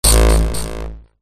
sound design glitch buzz

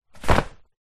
wetsuit top drop ground

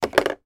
toy binoculars put down on table

